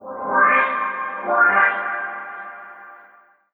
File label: ORCHFX, orchestra, Asian Traditions